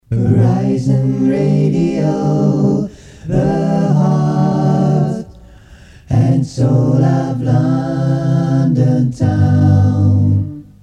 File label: Acapella; Horizon Radio; Horizon Radio Jingles 1981 to 1985